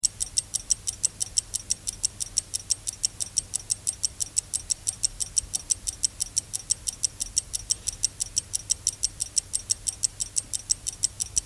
Pocket Watch, Very close pocket watch ticking - old fob watch; Clocks; Machines; Tom Hutchings